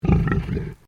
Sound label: Animal Pig Landrace Growl; Edgar; Edgar's Mission Farm Victoria; Pigs; Animals